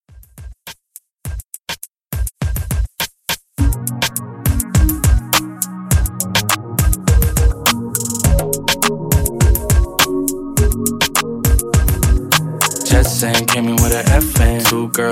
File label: Hip Hop R&B Redrum; Remixfreakz